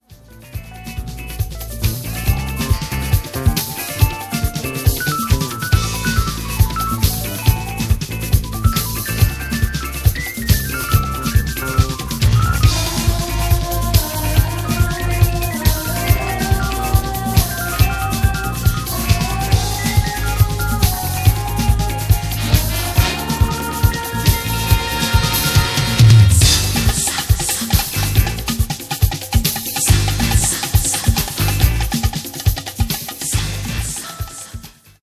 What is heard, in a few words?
Samba Funk